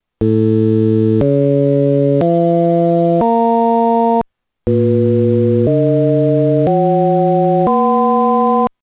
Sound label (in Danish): Skala Strech Partial Alm Eller Strech